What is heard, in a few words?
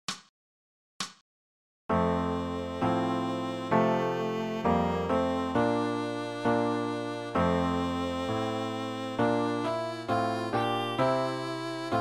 Gavotte, viola